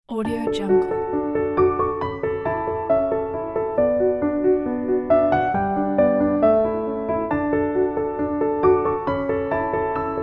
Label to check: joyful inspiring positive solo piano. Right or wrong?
Right